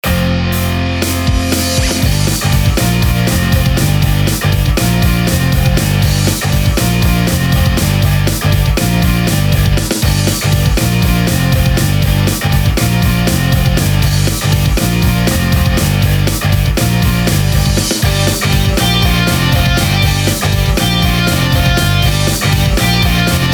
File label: funny punk rock; TowerDefense; some music for the game